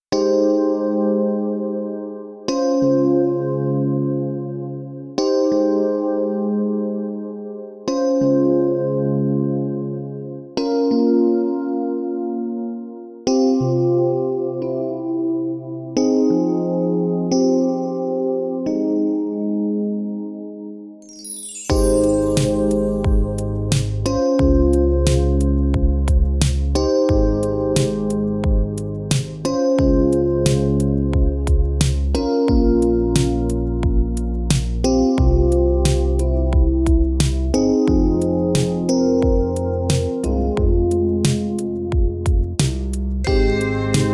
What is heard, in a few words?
chillout; آهنگ های لایت بیکلام مخصوص آسانسور